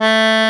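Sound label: bayan A3, Russian; EdgeSounds; Drum Mashines VOL-1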